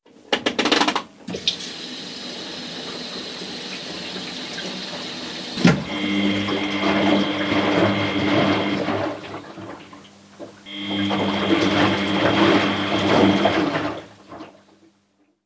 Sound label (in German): Waschmaschine; geraeuschebingo